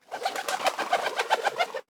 poultry chicken; soundbits-soundfx

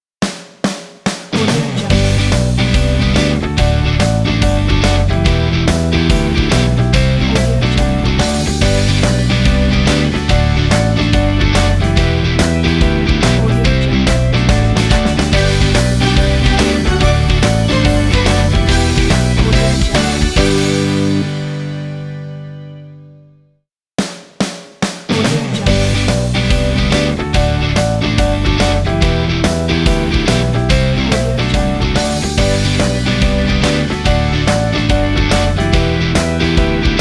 fun energy positive rock ident

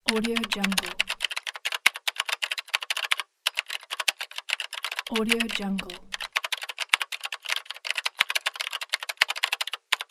typing

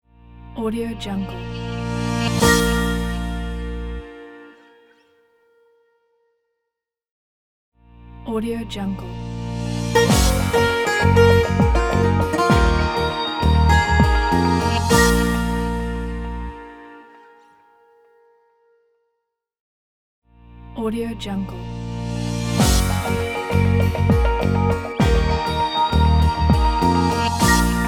inspirational corporate logo

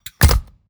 footsteps robodog layer; Robotic Lifeforms 2; soundmorph

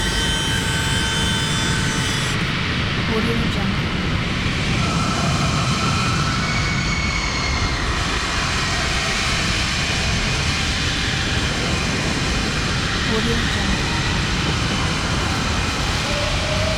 plasma fire; prosoundfx

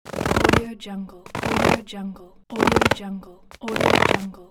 snow steps

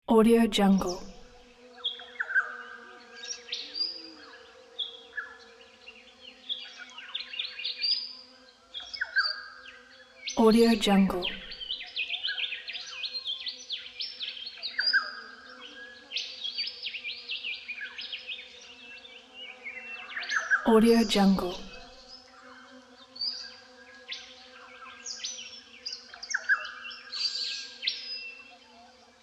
forest morning ambience

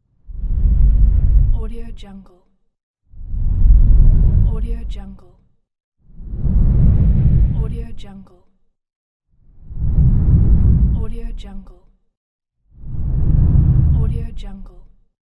woosh deep

